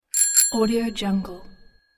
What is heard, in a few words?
bike bell